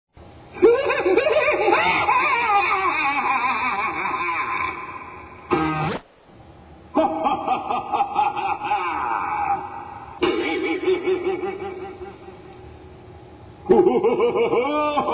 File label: Sinister Laughing